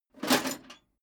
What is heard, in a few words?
metal plate armor movement single